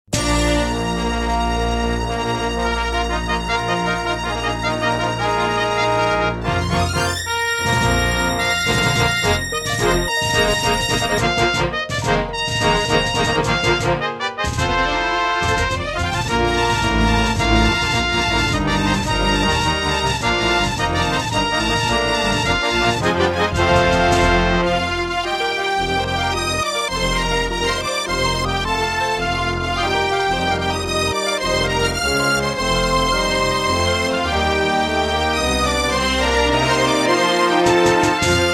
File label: Intro, Creative Wave Blaster; Dark Forces